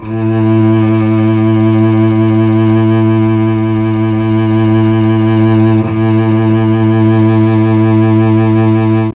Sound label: A1 CELLO, mellotron; CHMBLN CELLO